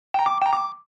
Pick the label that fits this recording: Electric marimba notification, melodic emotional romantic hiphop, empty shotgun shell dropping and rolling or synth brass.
Electric marimba notification